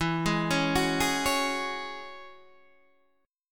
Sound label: E 6th Add 9th E, Guitar Standard; arpeggiated; chord; 12,11,11,11,9,9